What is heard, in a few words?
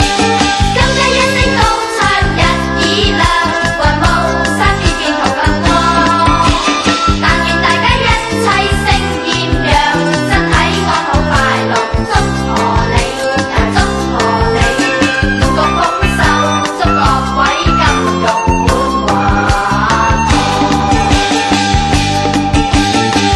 cantonese new year